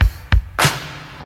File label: 97 bpm classic pop drum groove A key; drum loop